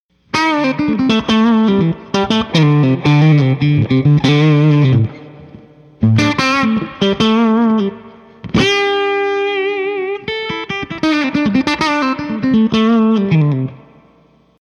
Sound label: tele lead